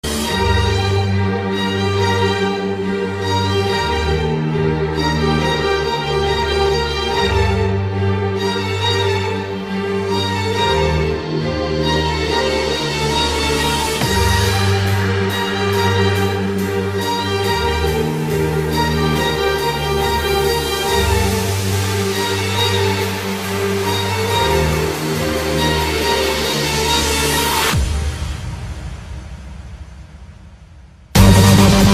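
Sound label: dubstep remix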